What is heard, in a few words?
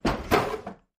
drawer open